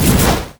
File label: renekton execute; renekton volcano god